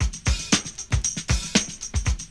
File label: HIPTAMB 116, loop; 115-121 BPM